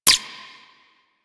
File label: Grenade Count; Warframe; Corpus; Jackal Redux; Weapons